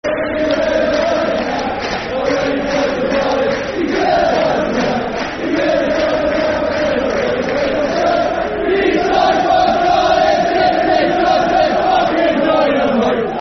The Magic Hat Football Chants (Soccer Songs), Cardiff City; fanchants-com